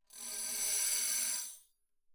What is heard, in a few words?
tambourine finger roll ff; Percussion